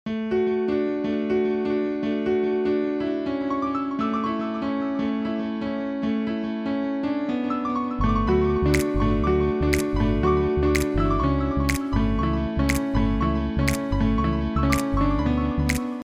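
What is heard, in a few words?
Reveille Music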